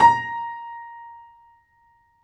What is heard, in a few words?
FF A#4 off, Grand Piano off mic; AKAI S6000 CD-ROM - Volume 2